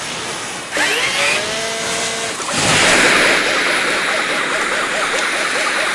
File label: homing missile; weapons; dota; gyrocopter